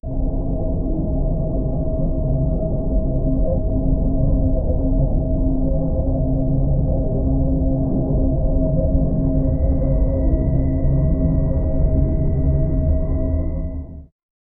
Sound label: apocalyptic atmosphere; apocalypse